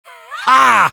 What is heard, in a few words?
taunt strike; tf2; demo